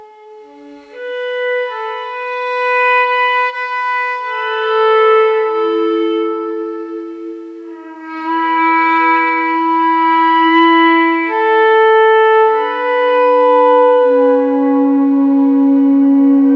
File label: sacred instrumen, oud